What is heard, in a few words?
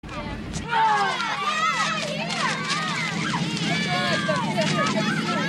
INDISTINCT SHOUTING AND SCREAMING